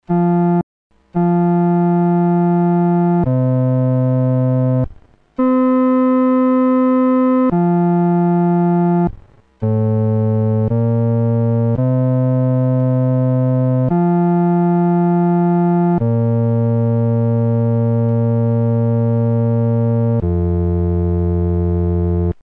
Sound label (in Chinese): 独奏; 阿门颂（三）